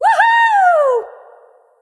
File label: "yay"; smite; nuwa